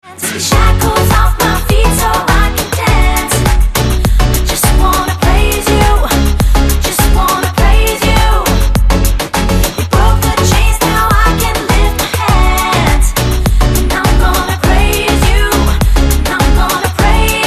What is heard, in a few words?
ringtone